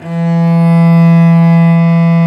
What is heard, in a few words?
STR Vc Arco p